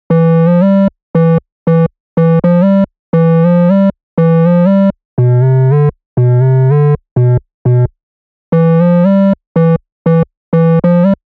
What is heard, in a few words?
bass